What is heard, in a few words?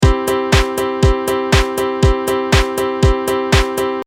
cubase piano long